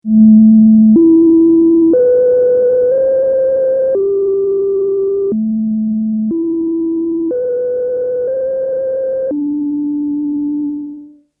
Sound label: Resonance Noise Sustain dry